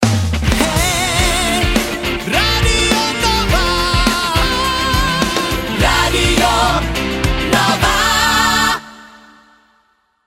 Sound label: Jingle; Radio Nova